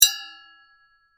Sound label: Sai Hit Impact Metal, Warfare; Swords; Combat